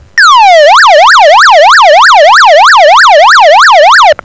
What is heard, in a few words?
yelp